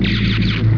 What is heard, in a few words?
blast